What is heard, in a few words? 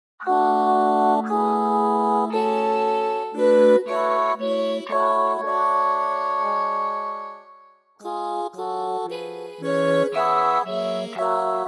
chorus